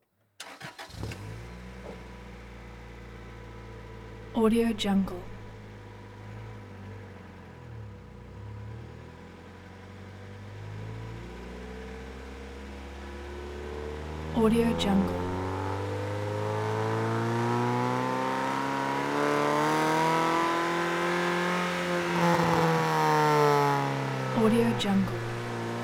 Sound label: onboard street race car medium drive with start and idle